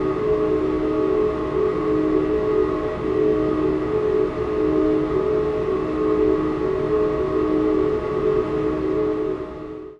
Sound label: whistle unprocessed; supermodal